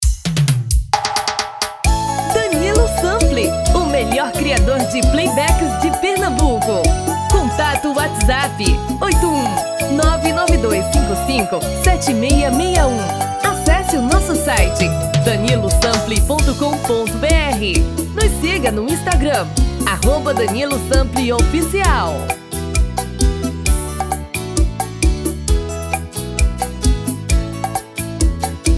Serestao

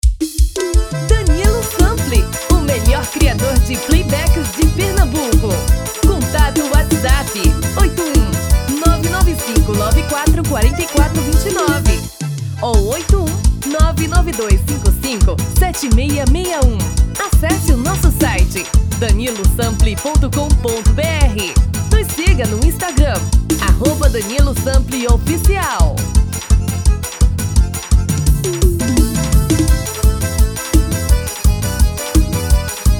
Arrasta pe

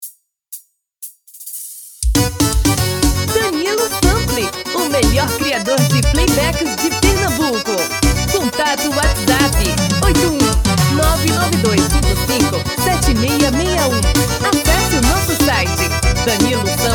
Baiao

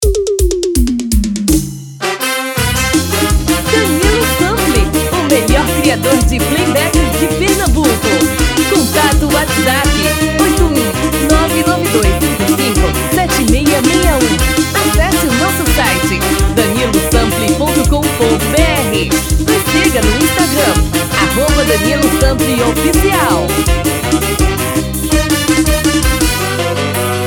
Frevo

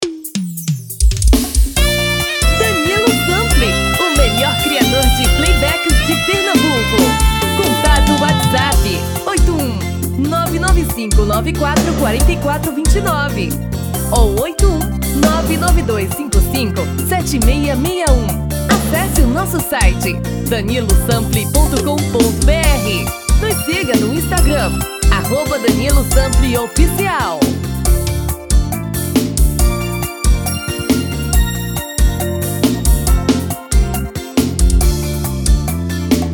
Brega

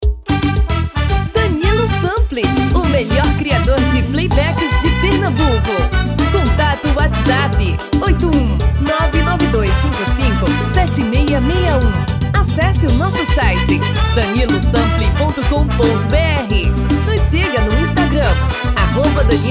Forro das antigas tom feminino

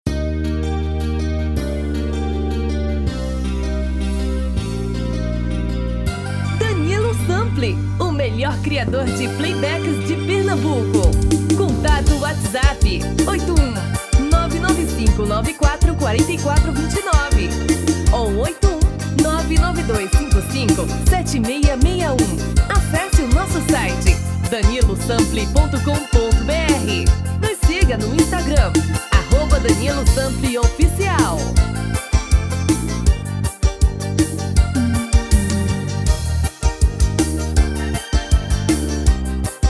forro das antigas; dois tom abaixo

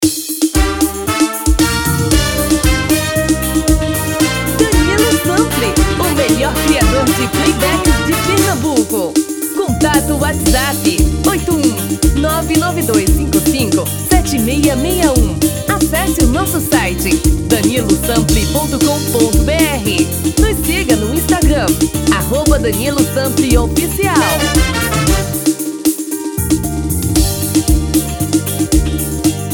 Frevo canção

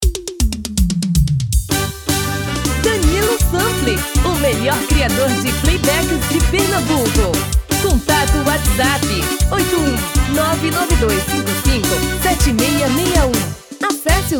FREVO tom feminino

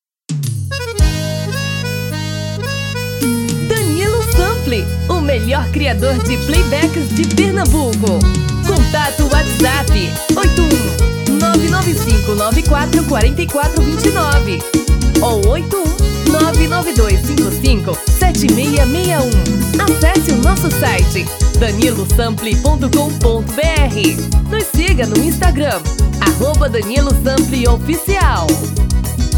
Forro das Antigas tres tom abaixo

